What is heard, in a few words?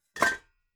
pots rattle